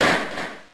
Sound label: Karate Chop